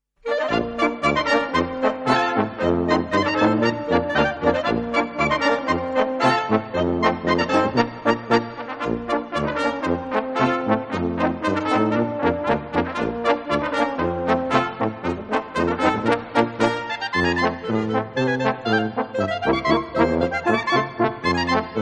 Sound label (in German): Schottisch, Kapelle